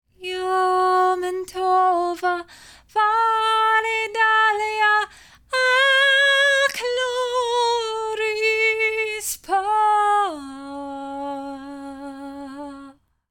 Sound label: Phantom Sing; Hollow Knight: Silksong